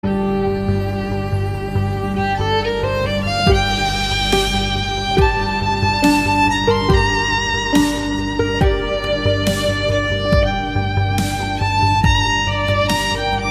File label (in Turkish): keman zil sesi; cep telefonuna keman sesleri